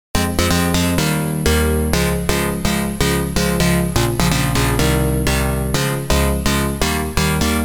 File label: Chord Gameboy; MusiCore